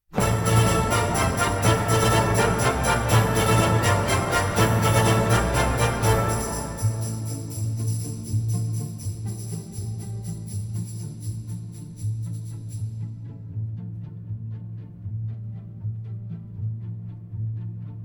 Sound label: piano